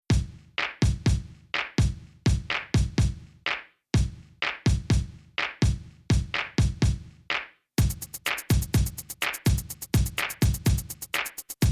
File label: only drum